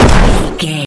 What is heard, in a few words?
explosion small no tail